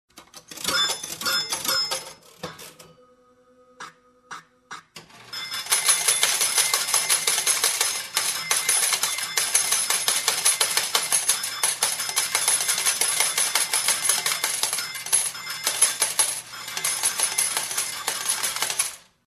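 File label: sound of a slot machine jackpot without melody; Звуки игровых автоматов, однорукого бандита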